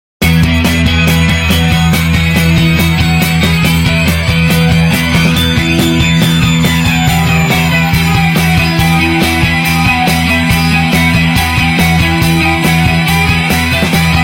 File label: nokia lead ringtone